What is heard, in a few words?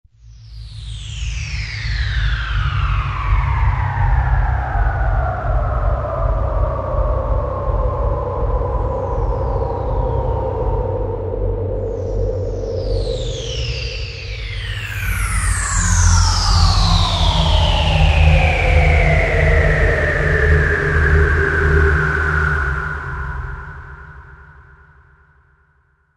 The UFO Appears; Korg TR-Rack